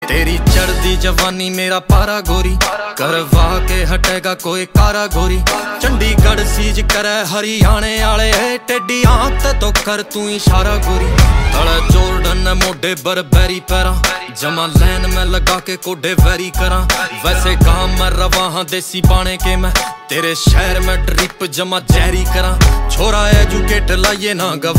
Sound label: Ringtone